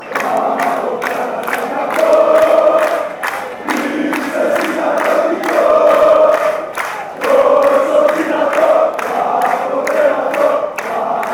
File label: paok fc chant